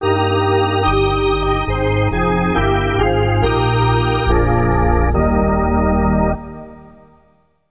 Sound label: Hockey Organ Oh Canada